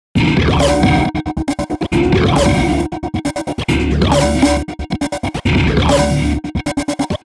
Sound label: disko; sugar bytes; effectrix